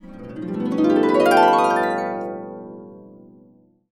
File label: B, Harps; USB Soundscan vol.62; AKAI